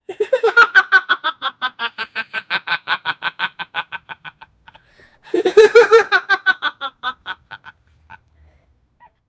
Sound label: 9 year old african am; father; has 9 year old african am